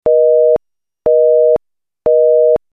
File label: phone busy; gmod; perp2.5